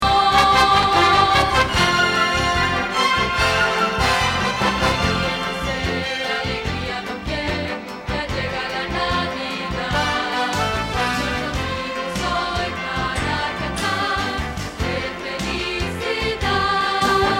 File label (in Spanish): Contralto